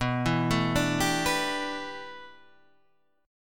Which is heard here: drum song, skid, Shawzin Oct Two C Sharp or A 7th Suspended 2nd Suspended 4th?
A 7th Suspended 2nd Suspended 4th